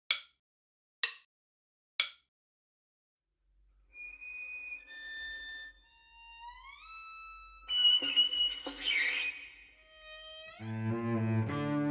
minus Violin I

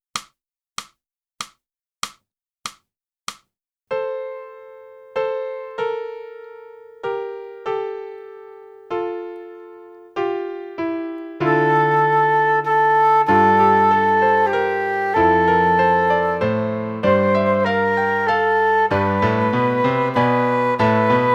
flute; boosey

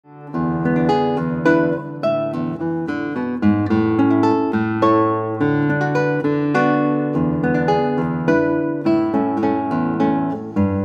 Guitar

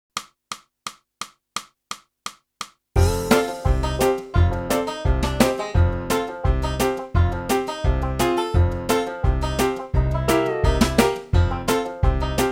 clarinet; boosey method

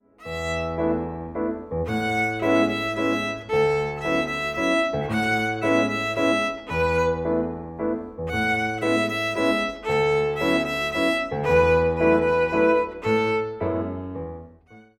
VIOLIN; Trinity College London violin exams for 2016-2019

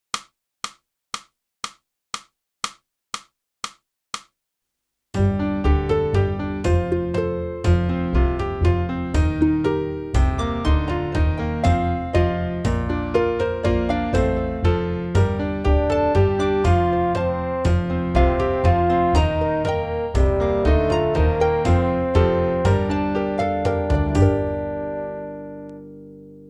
sax

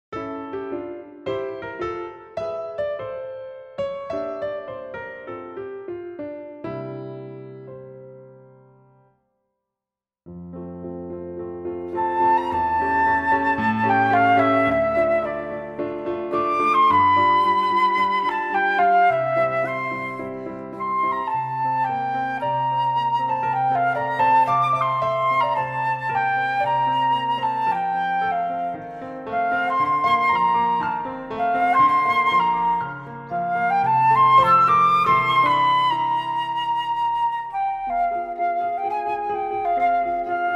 Full Flute

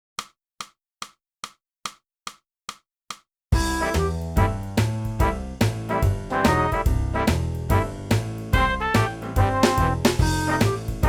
sax

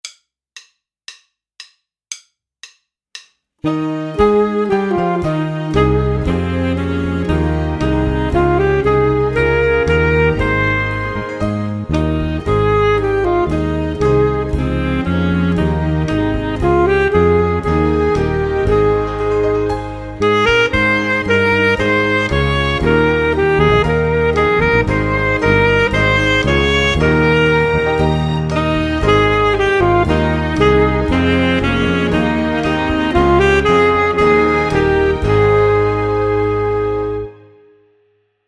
Ellacombe Full Sax

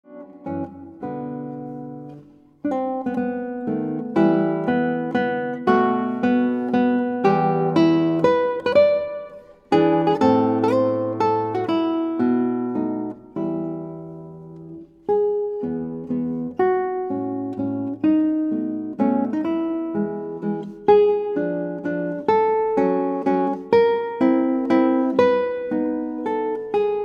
Guitar

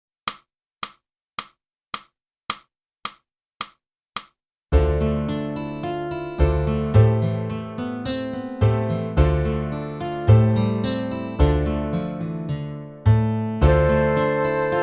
flute; boosey

